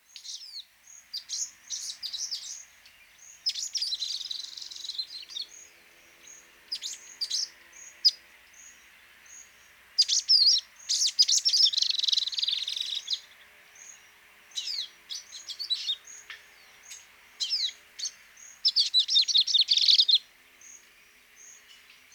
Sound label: Cinnyris Frenatus; Sahul Sunbird; Nectariniidae (Sunbirds and Spiderhunters); Family - Nectariniidae (Sunbirds and Spiderhunters)